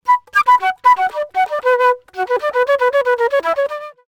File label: flute